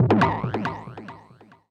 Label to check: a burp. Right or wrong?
Wrong